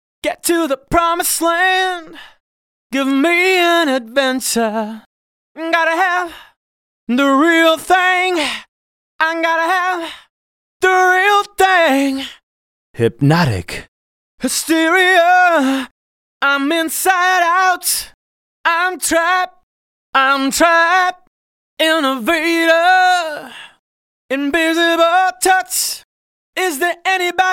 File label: Acapella, Techno Trance; Techno Trance Essentials